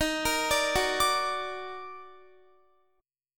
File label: D sharp Minor Major 7th, Guitar; arpeggiated